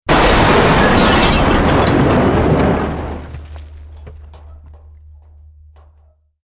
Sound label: Building Collapse Medium